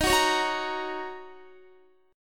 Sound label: D sharp Minor 7th, Guitar; strummed; JGuitar